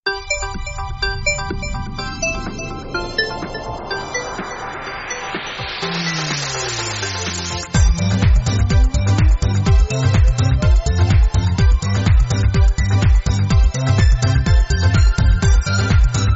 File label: Pop